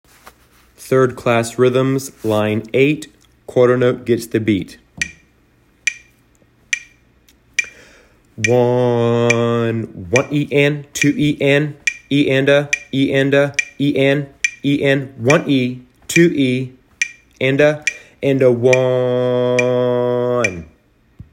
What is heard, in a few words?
rhythms